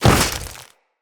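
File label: footstep on ice, enemies; Footsteps; Grineer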